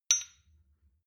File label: shot glass clink soft; berufe; soundpool